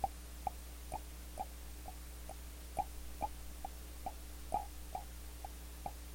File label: pulses